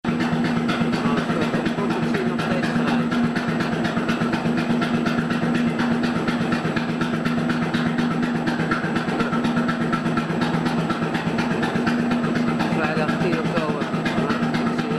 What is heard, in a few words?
Tunnel; Kromhout 2K3